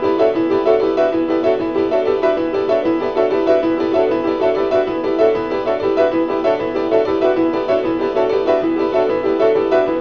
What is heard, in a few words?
6 pianos; loop